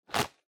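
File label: Sensu Close, folding fan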